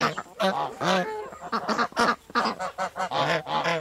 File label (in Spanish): Patos